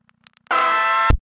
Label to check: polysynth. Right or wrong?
Wrong